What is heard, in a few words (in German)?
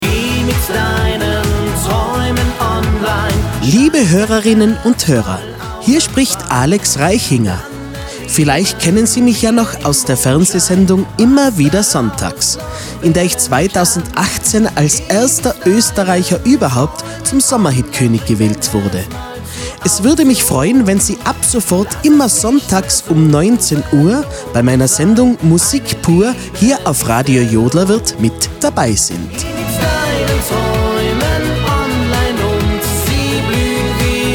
Jingle Radio; Jodlerwirt